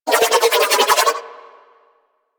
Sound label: Nunchaku Quick Spin Whoosh; Weapons; Warframe; Tenno